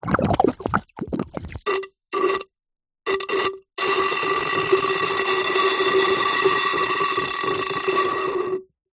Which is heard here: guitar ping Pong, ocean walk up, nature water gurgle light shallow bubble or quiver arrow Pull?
nature water gurgle light shallow bubble